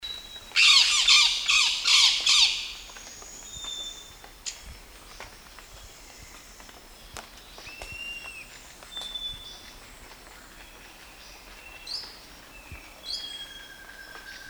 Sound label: bronze winged parrot